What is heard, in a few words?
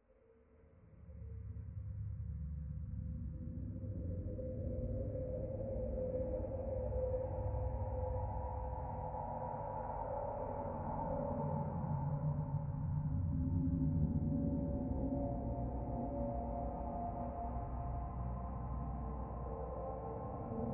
Energetic, Meditation; Музыка для медитации с аудиостроб дисков